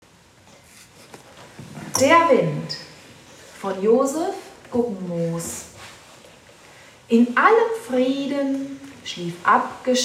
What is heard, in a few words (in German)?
Der Wind